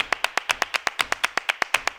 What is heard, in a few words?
120 CLAPS